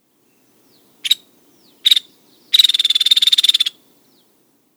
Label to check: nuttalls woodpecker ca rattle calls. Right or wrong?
Right